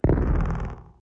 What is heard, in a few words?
Darkboom; Gonner